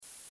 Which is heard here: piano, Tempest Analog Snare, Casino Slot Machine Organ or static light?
static light